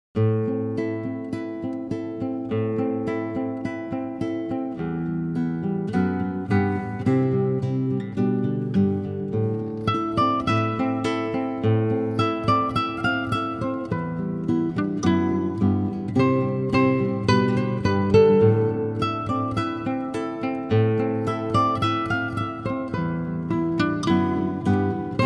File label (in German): Schlaflied